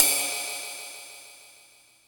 D# Ride Cymbal, Yamaha PSR E305

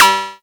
percussion, drum machines, FutureRetro FR777

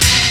HIT, HIPHOP; Rubber Chicken Software Sample Bank

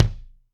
Kik 22 inch deep, Alesis D4

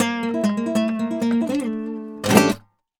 Flamenco, Guitar, a

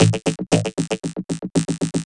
Processed Perc

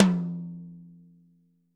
Toms; Bob Clearmountain Drums I

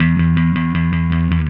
Happy Pub Bass

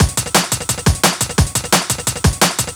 DNB BREAK

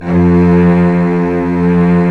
Vcs2 f vb; STR Vcs 1-2 vb

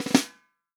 REAL DRUMS FUNK KIT

